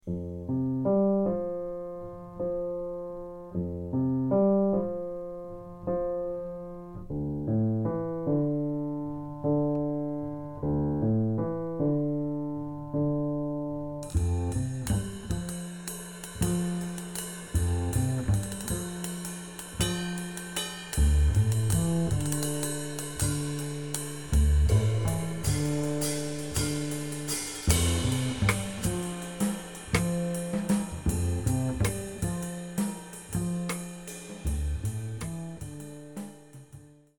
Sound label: bass drums only